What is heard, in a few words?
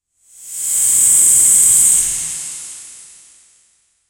sound effect, cosmetics